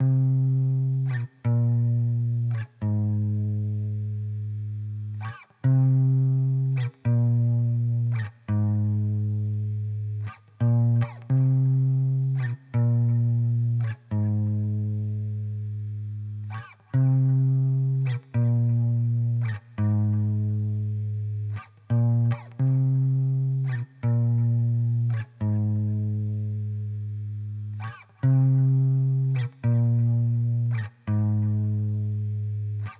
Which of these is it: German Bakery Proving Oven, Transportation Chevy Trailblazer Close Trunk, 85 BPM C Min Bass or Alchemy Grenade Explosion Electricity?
85 BPM C Min Bass